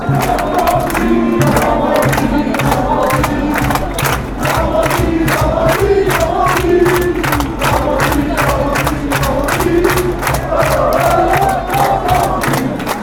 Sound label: chants; normandie